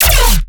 bass hit chonk lazer E; Virtual Riot

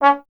Trombone C#; Miroslav Vitous - Solo Instruments VOL-1

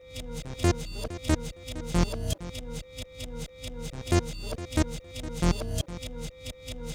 Get Your Groove on, 138 Fmin, Hardcore Breakbeat; Big Fish Audio; Hardcore Breakbeat Action

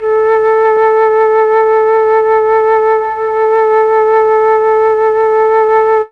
flute; ILIO; Propeller Island Legendary M400

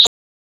PHONE

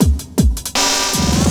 LOOP, 160 BPM, Drum & Bass

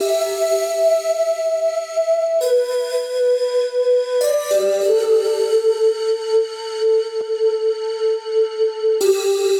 E Phrase Air Melody; Zero-G; Future Wave

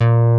MOOG 1 A#1; E-MU Formula 4000 Series Vol. 3; Analog Odyssey; VK Instruments

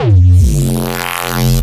Reese Bass F; Antidote Advent

